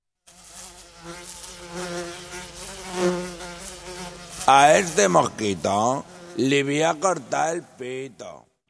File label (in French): mouche espagnole